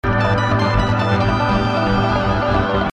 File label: Lead git thing